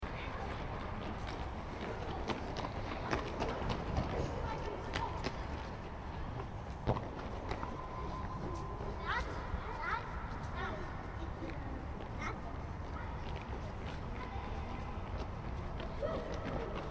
Sports Hall, Interior: Ambience, Kids Five-A-Side Soccer Game, Reverberant; Stuart Duffield